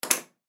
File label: Ship Cabin Door Unlock Hard; Transportation Watercraft